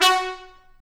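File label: BRS TP STA, brass trumpet stabs; Roland L-CD702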